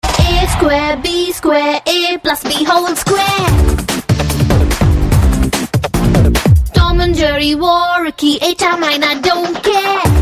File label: new telugu love ringtone